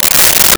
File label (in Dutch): schuif, Thuis; Home-made